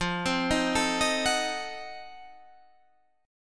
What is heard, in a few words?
F 7th Flat 9th F